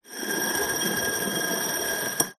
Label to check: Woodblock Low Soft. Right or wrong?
Wrong